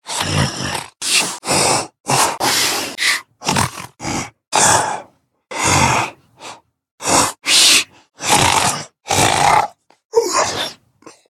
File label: Swamp Pig Elder Sleep Voice; Gorge